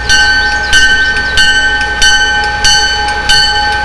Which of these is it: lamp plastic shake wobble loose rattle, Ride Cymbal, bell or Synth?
bell